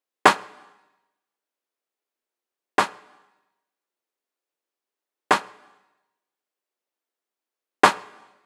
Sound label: Snare Loop 95, Afro Dancehall; Afro Drums; Kits; Ghosthack; Drum Mega Bundle